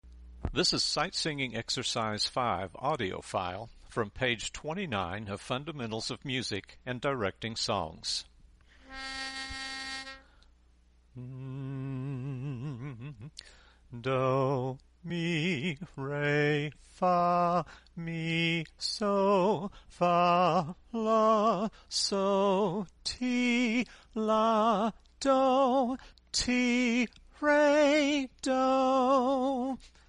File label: Sight Singing